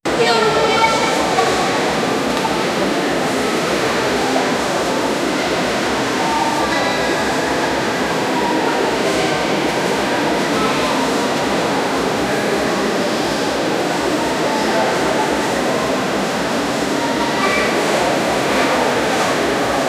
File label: shopping center sounds